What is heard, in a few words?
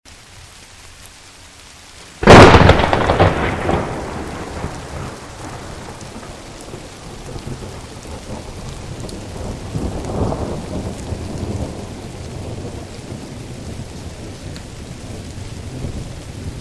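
sound of thunder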